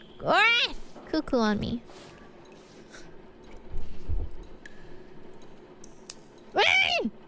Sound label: gremlin noises